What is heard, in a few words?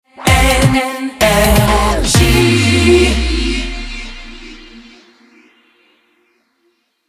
Jingle Rapid Fire; NRJ